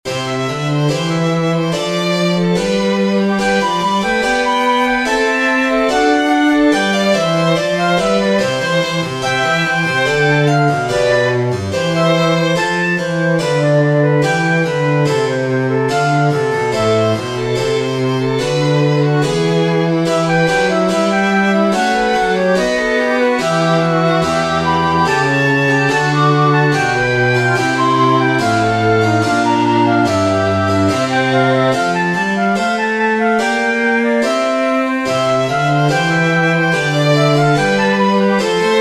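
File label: Trio Sonate C major Vivace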